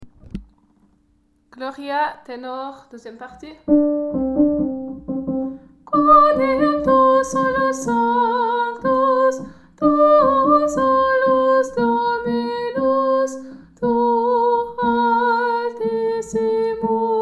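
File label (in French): gloria ténor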